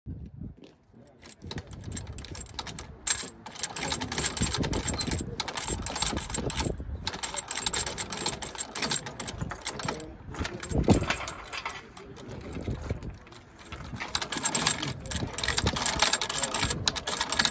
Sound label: Filling magazine; پر کردن خشاب